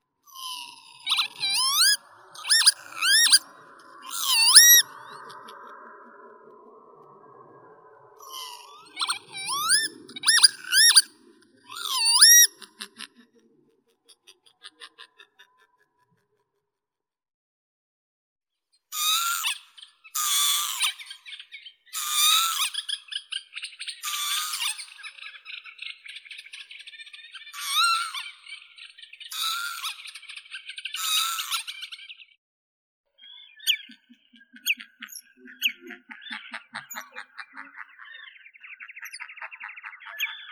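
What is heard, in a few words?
northern lapwing, vanellus vanellus; chibis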